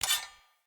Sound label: Player Short Sword Fast Equip; Weapons; Swords